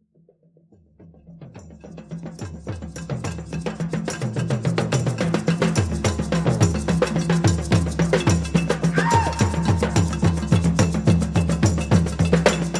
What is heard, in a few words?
Persuasive Percussion